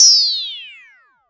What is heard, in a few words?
Zap Ring Long, FX Hit; One Shots; Drums; Ableton Live 12 Lite; Core Library